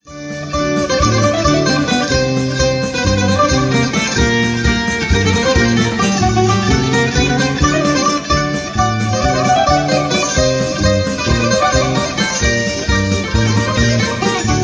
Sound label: hellenic instrumental